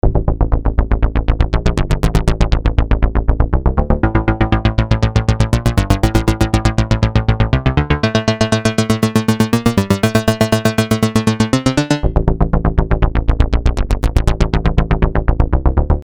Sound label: synth